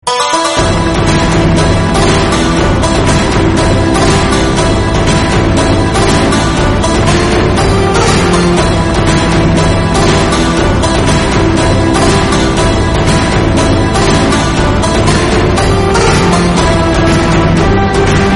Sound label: music, ringtone